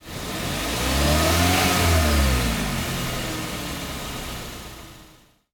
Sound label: Outside Car Accelerate; Nova Sound